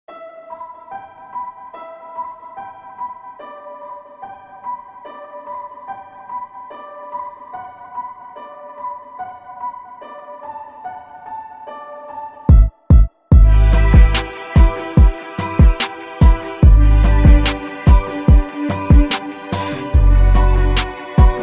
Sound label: Beat; Zugabe